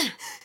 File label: Grunt; Lewd SFX